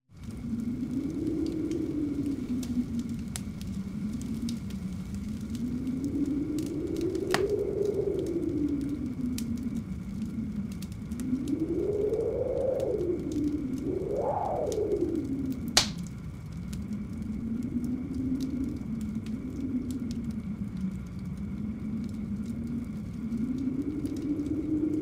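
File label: wind sounds; zvuki vetra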